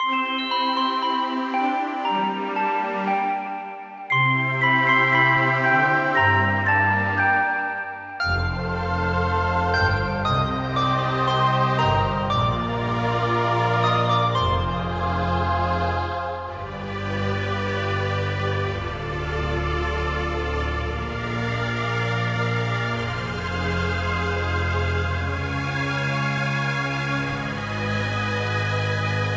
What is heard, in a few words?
music strings; Bejeweled 3